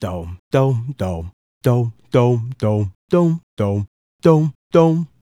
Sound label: Male Solo, Hip-Hop and RnB Vocals